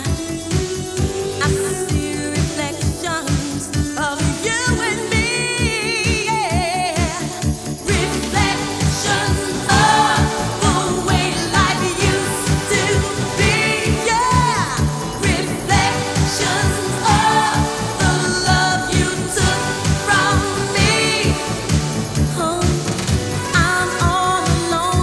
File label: disco